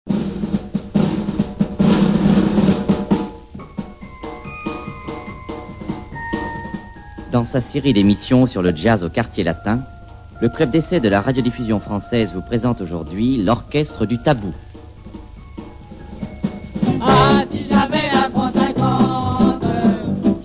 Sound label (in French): jazz au quartier latin; EMISSION RADIO EMISSION CLUB D'ESSAI DE LA R.D.F "JAZZ AU QUARTIER LATIN" R.D.F (31 Octobre 1947); Orchestre du Tabou